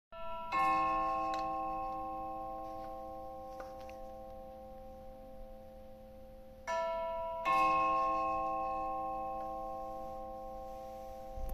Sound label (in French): clocher